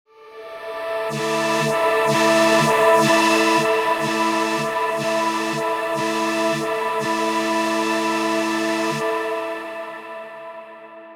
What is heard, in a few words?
Wavetable MPE presets